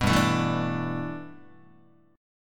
G 6th Add 9th G Guitar, chord; G6add9 strummed

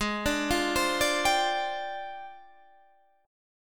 F Minor 6th Add 9th G sharp

